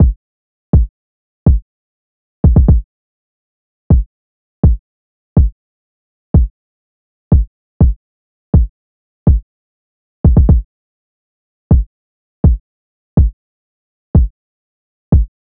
Drum Loop 123 BPM Kick; Drum Loops; Loop Stems; Cymatics; Guard Drum Loop

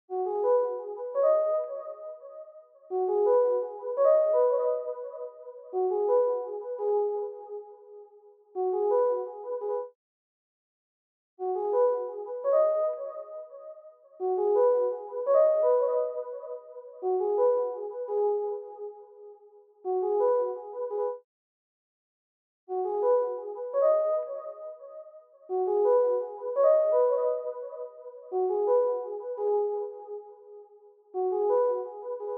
85 BPM C# Min Sine Bubbles; Melody; RnB; Loop Stems; Cymatics; 2022 Melody Collection - RnB Pt 1; Distance

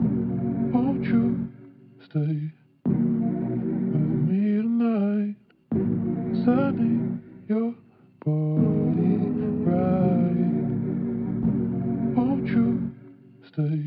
84 BPM D# Min, Melody; Tonight

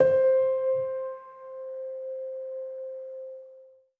KEYS Concerto - C; Synth One Shots

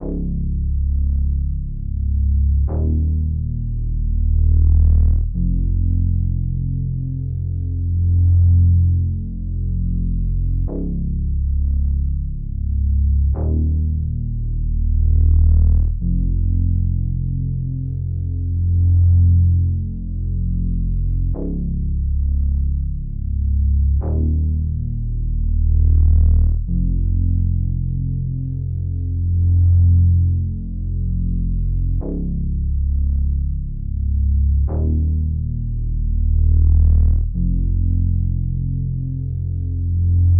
Bass, 90 BPM F Min, RnB; Melody Loops; Cymatics; ANALOG EVOLUTION Beta; RnB Collection

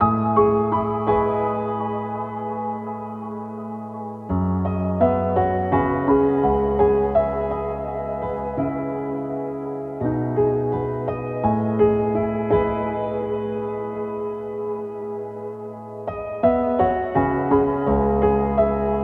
Piano C#m 84, Lo-Fi; Melody Kits; Loops; Ghosthack; HipHop; Glow

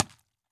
impact squeeky, Cartoon film effects

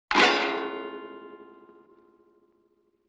Detuned Guitar Strum; Vintage Cartoon; Musical Elements

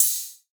ride, cymbals, drum one shots

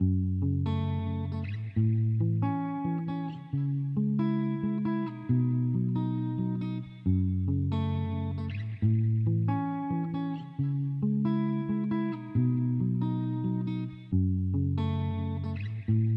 136 BPM E Maj Main Guitar; Loop Stems; Cymatics; Utopia; Lovers

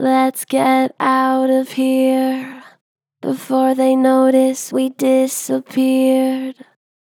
Pitch Flat Gentle, Vocals; Lets get outta here; Harmor Vocals

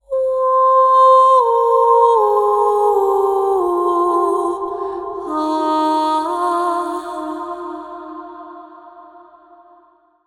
Oh Intro Vocals - Fm 80Bpm WET; Construction Kits; Ghosthack; SkyRealm; Kit 08 - Into The Light

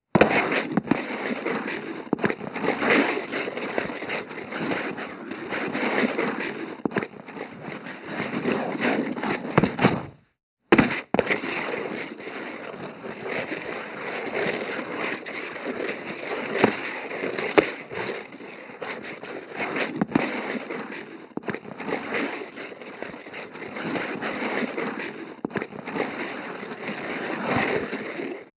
Shoes Dragging Scraping; Fairlight Sound Lib

